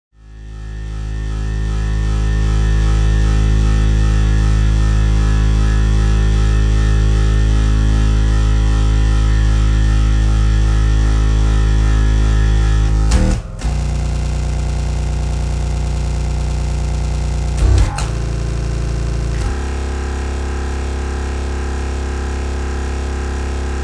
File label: Internal Static